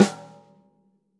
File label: snare snares on; JazzFunkKit